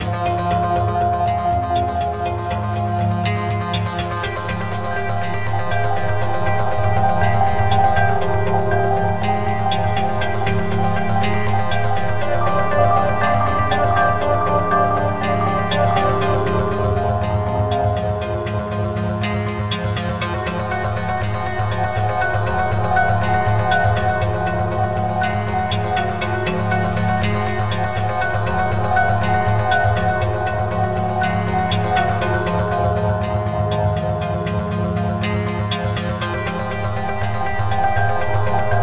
Cinematic Electric Music Drama Action Beat Chill Relax